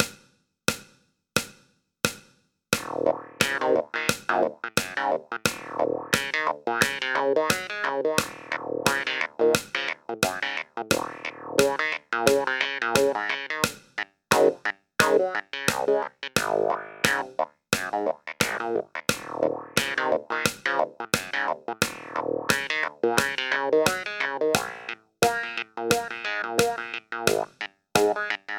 clavi solo; funkastic; sampleson

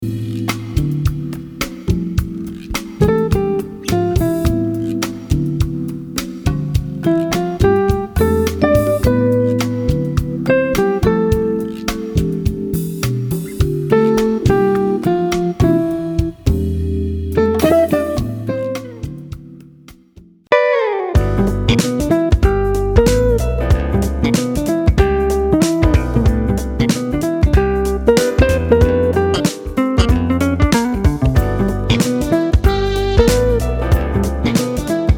bossa, smooth jazz guitar; audiolounge soft

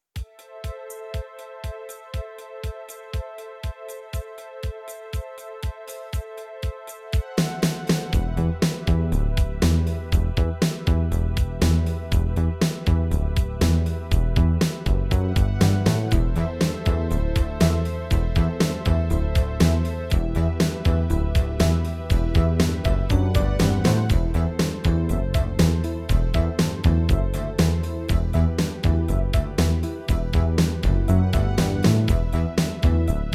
wet bass; linear audio

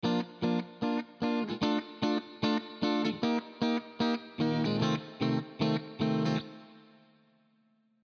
strato wet chor; Chiqui Audio; Chord Progression 54 150 Bpm Bb Maj; Fender Stratocaster

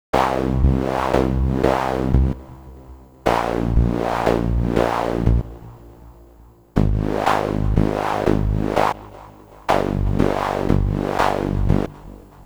seq mono fm with saw and noise; the meditation soundset

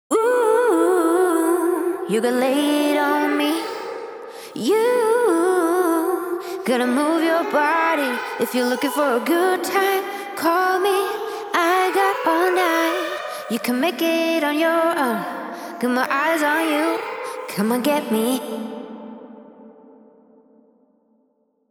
holy vox vocals; anise glide reverb; audio spices

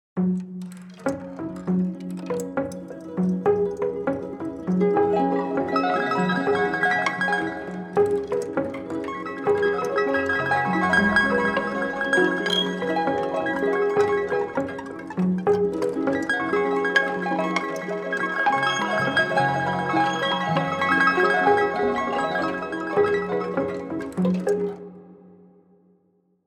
outlines long landscapes

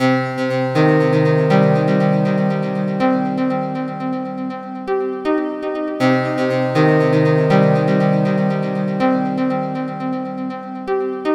echo pluckstring; firefly synth 2; sjoerdvankreel

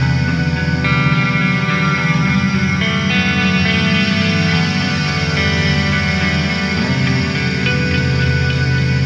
guitar riff wet with airspace; airspace hybrid reverb and delay; modeaudio

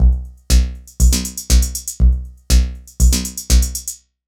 analog drum machine mod; modular effect board; sonic instruments

